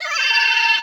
Wizard Mini Laugh

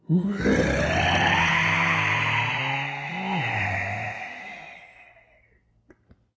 Grunt; Glitter

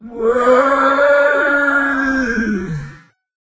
Future Groan

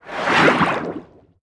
Snorkel rise

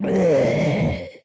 grunt

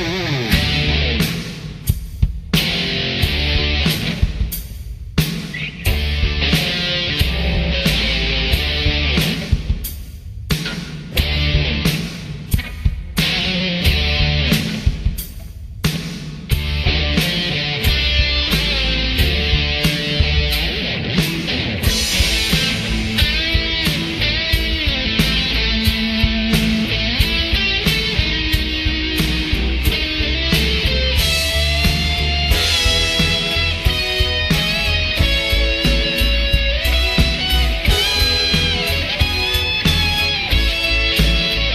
Metal

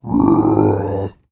Dino Groan

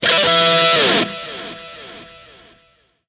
Guitar

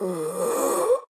Wizard groan

